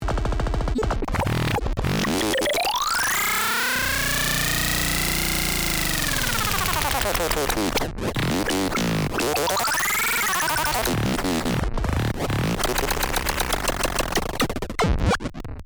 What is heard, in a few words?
Grain Machine Example Sample